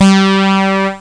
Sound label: tone